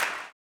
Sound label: CLAP, Blues Soul; Spectrasonics Vocal Planet